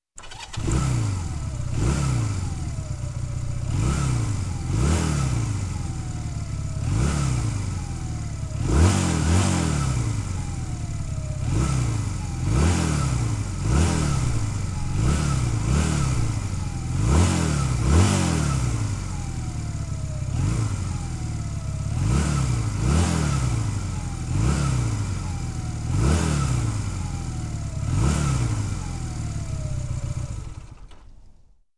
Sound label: Offroad bike engine sound